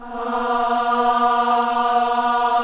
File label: KIDS CHOIR, Gospel